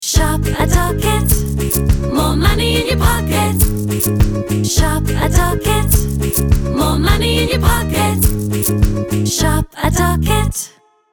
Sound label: Ringtone